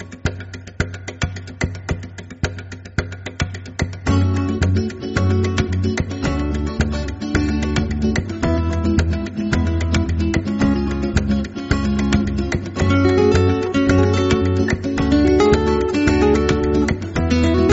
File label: acoustic guitar